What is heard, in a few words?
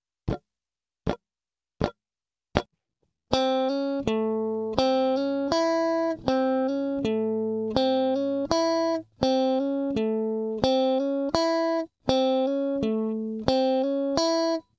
r lick, blues guitar